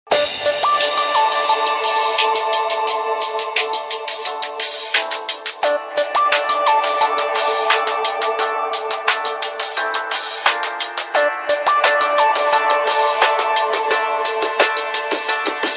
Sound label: Nice Wake Up Call ringetone